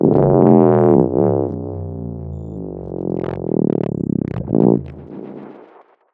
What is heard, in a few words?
Nuked, Impacts - Bass; SFX